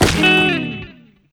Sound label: Luggage Open